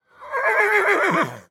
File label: manicorn neigh; project hawk